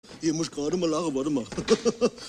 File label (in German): lachen